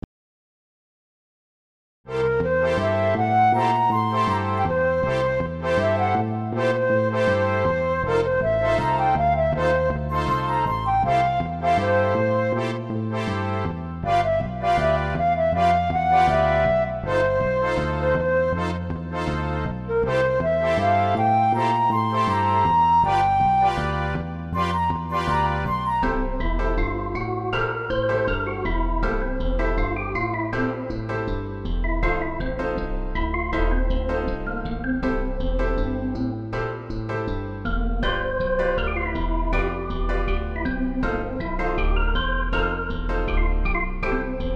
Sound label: MIDI blues